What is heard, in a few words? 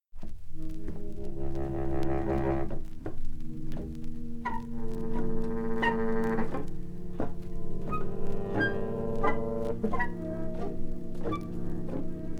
Saxophone Orchestra